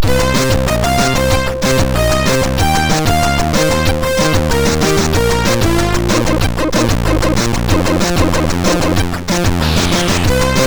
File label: Takt, MOS6581R2